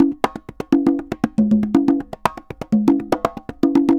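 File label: CONGAS SALSA, salsa drums and percussion; Best Service ProSamples vol.14; AKAI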